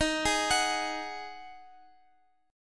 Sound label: D sharp Minor Double Flat 5th, Guitar, Open F; arpeggiated